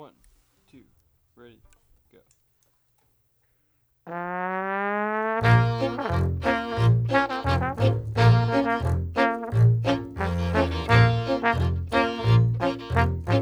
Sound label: backing track